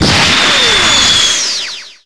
scatter beam; DBZ

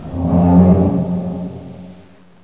Call; RPG Maker; Legend of Heroen v1.20

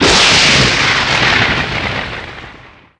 spell lightning cast; RPG Maker; Legend of Heroen v1.20

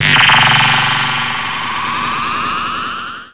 kame charge; DBZ

